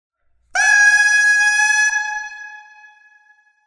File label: Bassoon technical reed